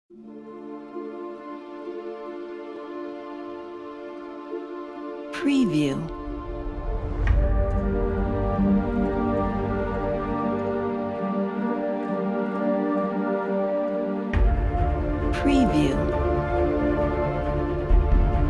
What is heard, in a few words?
epic cinematic trailer